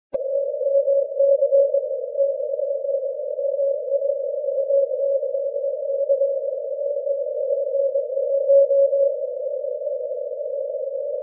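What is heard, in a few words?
80m continuous wave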